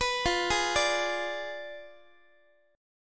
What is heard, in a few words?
F Diminished 7th, Guitar; Fdim7; x,x,14,15,12,13; 4A,4E,5A,5D,5G#,6C#; arpeggiated